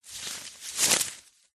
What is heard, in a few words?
magic plant vines leaves movement wrap around grab reversed